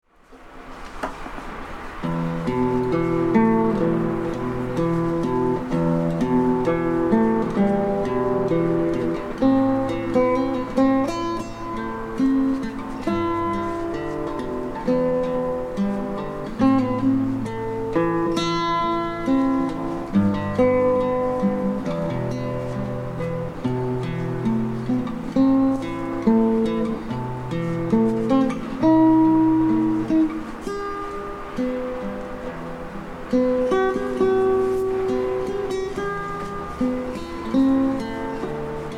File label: instrumental